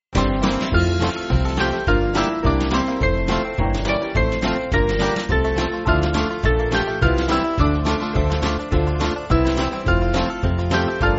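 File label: Band, Backing Files for Churches; We Praise Thee With; Clonmel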